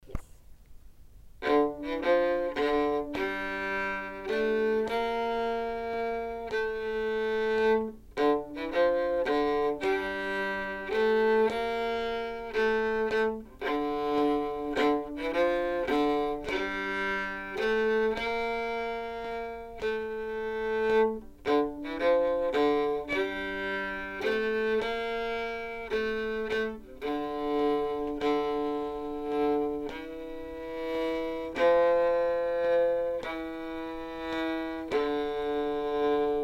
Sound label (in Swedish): cellostämma